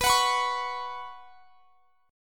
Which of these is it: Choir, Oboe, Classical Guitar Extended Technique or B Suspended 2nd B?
B Suspended 2nd B